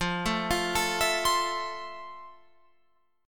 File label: F Major 7th, Guitar, Open F; arpeggiated